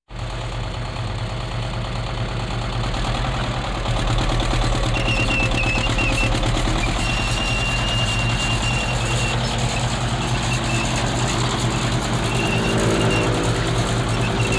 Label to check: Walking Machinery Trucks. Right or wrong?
Right